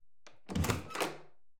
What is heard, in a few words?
DOOR; One Eleven Android